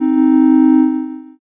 horn

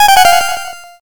lose

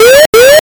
warning

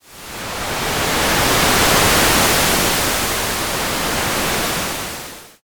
wave

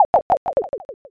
dice

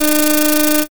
slot spin

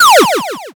laser

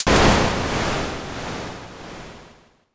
thunder

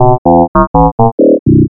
alien signal

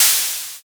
open hat

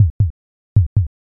heartbeat

